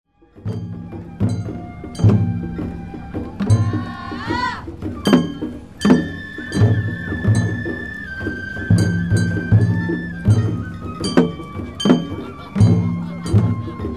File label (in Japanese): 本囃子, 弥生祭お囃子; 大工町; 弥生祭は日光二荒山神社の例大祭。